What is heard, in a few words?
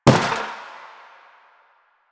Destructible Bucket Impact; Warframe; Ambience; Nineteen Ninety Nine